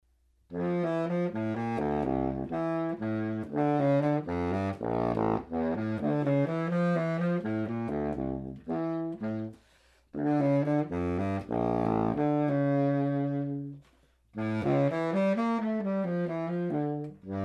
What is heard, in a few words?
bass sax